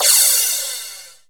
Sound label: FX Cymbals, Percussion; Dance Synths